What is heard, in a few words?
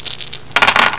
roll; BSDICE; Puzzle